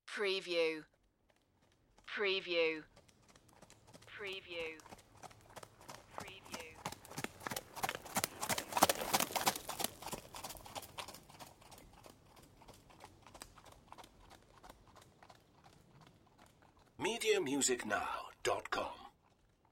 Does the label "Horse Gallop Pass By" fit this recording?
Yes